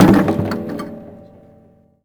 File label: Cello Drop; SFX Kit; Foley - Misc